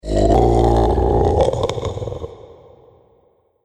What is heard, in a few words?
Zombie Slobber Chops; Zomby Sound Effects; Horror Sound Effect